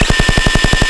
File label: hunter fire loop; npc; ministrider; Half Life 2; Episode 2